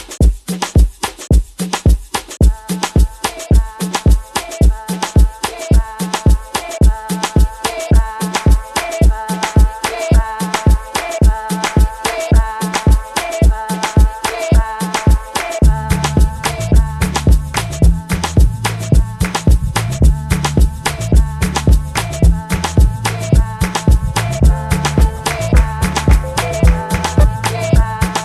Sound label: Bonus Beats